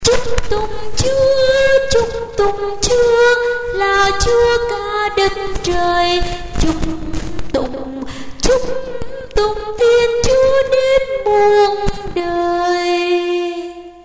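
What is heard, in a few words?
soprano tenor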